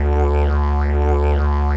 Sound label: DIGERIDOO; Best Service; World Colours